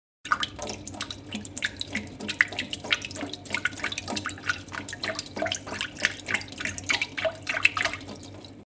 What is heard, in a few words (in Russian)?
plesk vody zvuk